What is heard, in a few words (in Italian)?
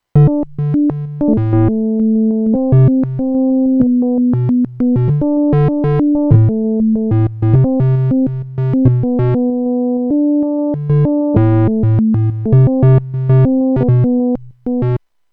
Suono generato con X LFO; Sonicware; Liven XFM